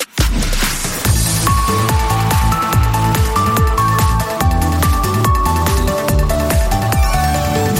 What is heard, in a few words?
Big Win Bgm